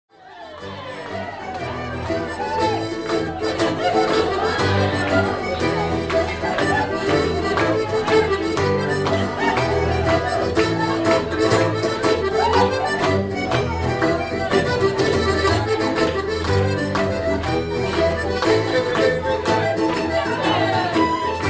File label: Barn Dance music